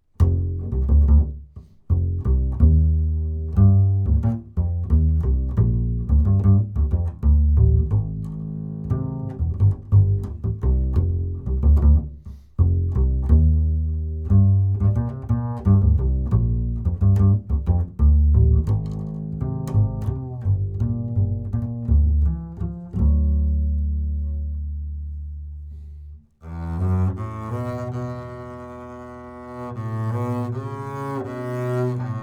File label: ACC BASS FET